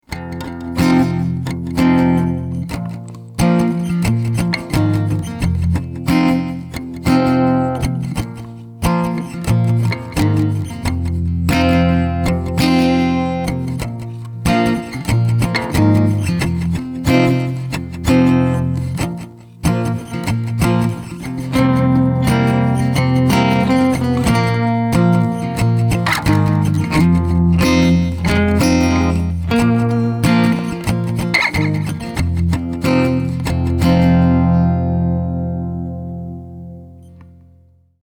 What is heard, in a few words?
sunday blues acoustic guitar; country blues